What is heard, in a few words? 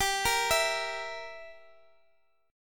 G Minor 6th, Guitar Open D